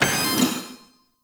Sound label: Turnstile Close; Warframe; Entrati